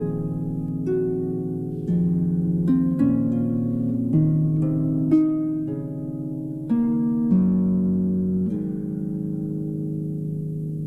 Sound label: Harp Concerto Lullaby